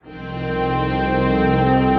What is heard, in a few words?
Stacked Strings; Instruments; Distressed Strings; Ableton; Mood Reel